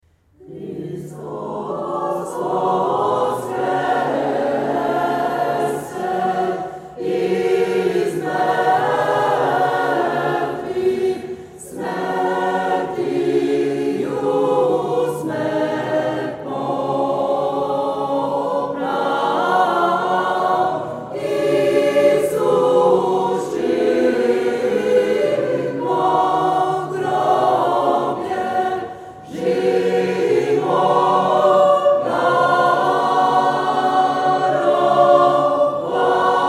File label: Christ is risen - Serbian choir; Concert May 2007